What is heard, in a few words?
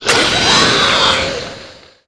spidermonster ouch